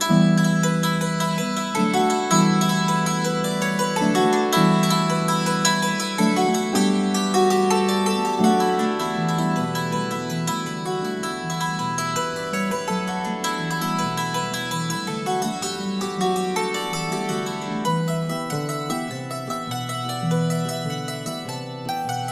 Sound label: Morrisons Jig